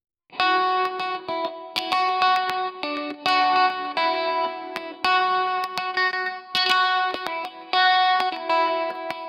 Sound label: acoustic crystal guitar; musical instruments